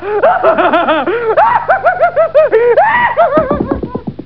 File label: laughing